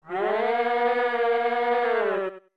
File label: Four Giants Shout